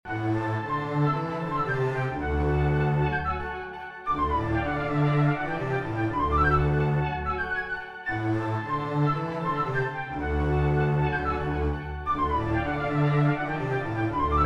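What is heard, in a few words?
Flute Cello Loop E Minor 120 bpm; Happy Bird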